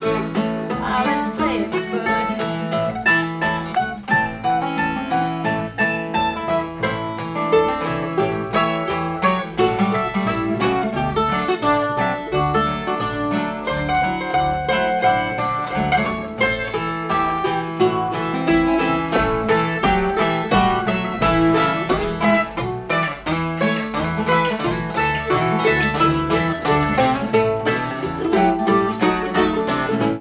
Blues

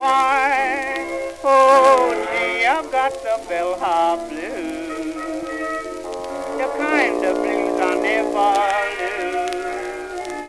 Bell hop blues, jukebox

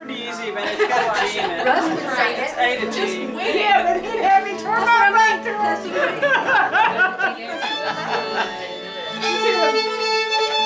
musicbox

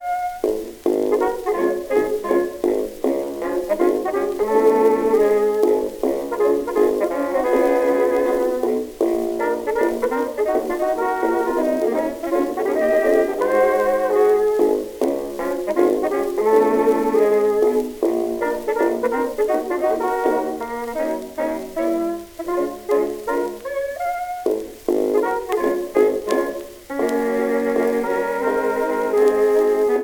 jukebox blues

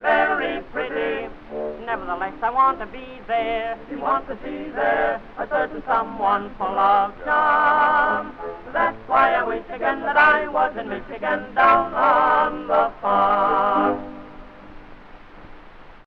tenor and chorus orchestra accompaniment

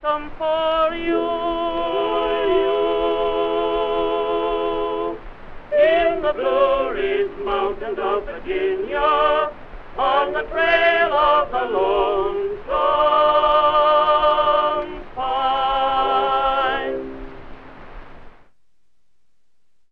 tenor and chorus with orchestra

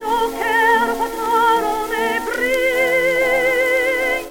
Waltz entrancing, classical

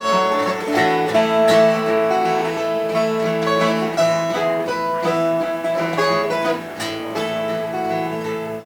musicbox

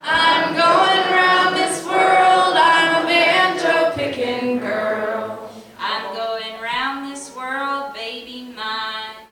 Banjo Pickin Girl, musicbox

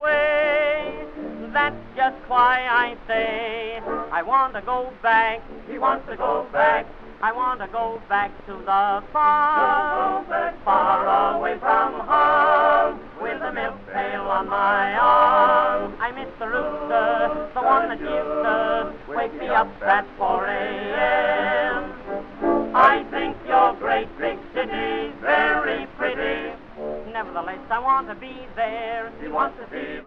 tenor and chorus orchestra accompaniment; NY Hippodrome

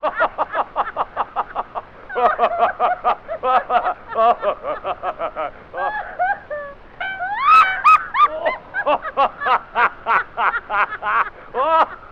Laughing record

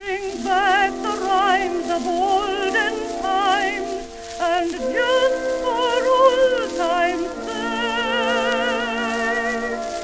Simple melody, jukebox, musical theater

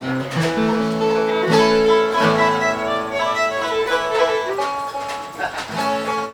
musicbox

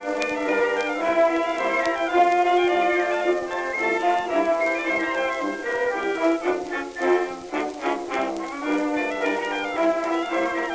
Jolly jingles, jukebox blues

